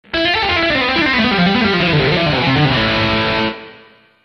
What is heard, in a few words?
Lick